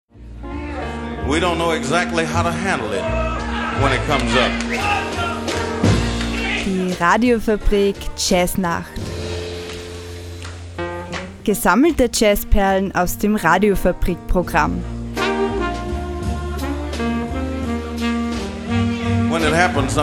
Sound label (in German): Jingle Jazznacht; Radiofabrik